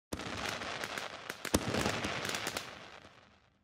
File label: ios18 sparkler message; Системные звуки и уведомления iOS 18 (iPhone 16, 16pro, iPad)